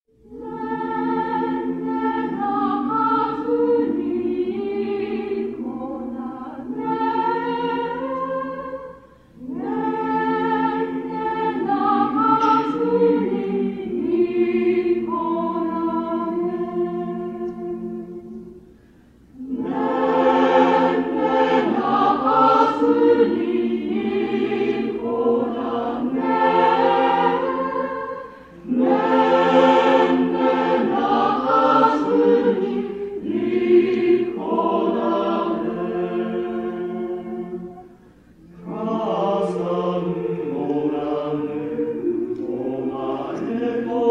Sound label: South African Lullaby